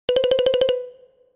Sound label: Peristalsis Notification Mobile